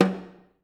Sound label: tom, drum; Masterbits - Total Zone